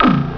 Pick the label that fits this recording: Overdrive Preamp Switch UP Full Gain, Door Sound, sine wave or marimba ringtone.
Door Sound